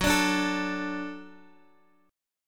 Gb Minor Sharp 5th Gb Guitar DADGAD

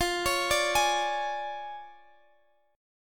D Minor Major 7th Flat 5th F, guitar

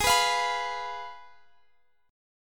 Ab Minor 6th Ab, down; Abm6; strummed

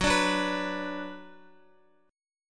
Gb Suspended 4th Sharp 5th Gb, down, Guitar; strummed; JGuitar

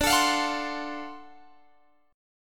D 7th Sharp 9th, Guitar Open G

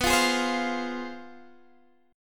C Major 7th Suspended 4th Sharp 5th B, chord

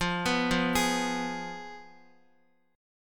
F Diminished Guitar; arpeggiated

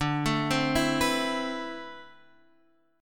D 13th Suspended 2nd D; X 5 7 5 5 7; arpeggiated

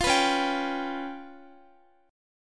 C sharp 7th Flat 5th Guitar, chord; C#7b5; strummed; tuning=4E,4A,5D,5G,5C,6F

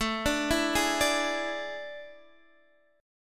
A Major 7th Suspended 4th

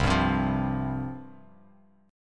C Suspended 4th C

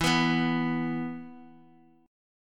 E 5th, Guitar; strummed; JGuitar

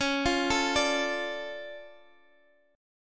D Minor Major 7th, C sharp, guitar

